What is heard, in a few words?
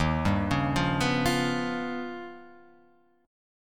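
E flat Augmented 9th, Guitar, Open F; arpeggiated; 3,2,1,0,2,0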